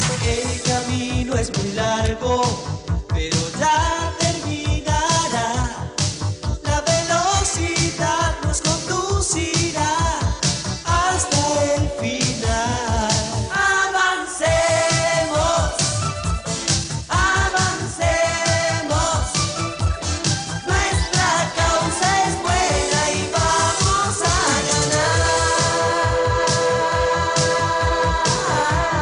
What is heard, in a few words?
Acoustic